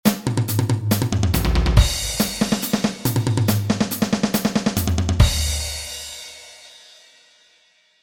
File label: drum fills tempo 140